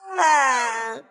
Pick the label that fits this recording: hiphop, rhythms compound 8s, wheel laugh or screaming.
screaming